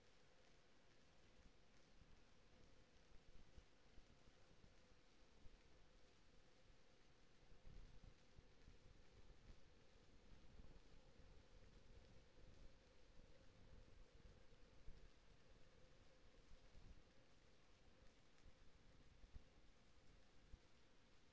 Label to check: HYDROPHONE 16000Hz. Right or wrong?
Right